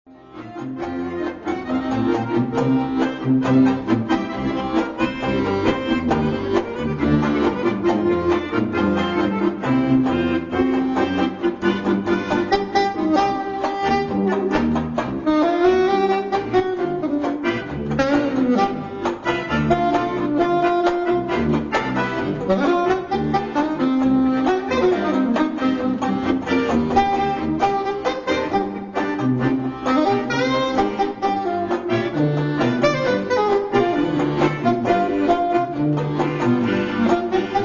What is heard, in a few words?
cajun